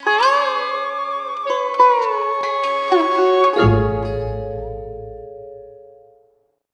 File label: Bari Sax; Simpsons Hit & Run; PS2